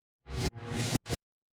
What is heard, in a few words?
ui undo